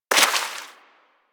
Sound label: Bullet Impact Debris Water